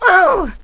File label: pain, female; kingpin; blunt